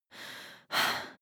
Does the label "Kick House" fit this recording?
No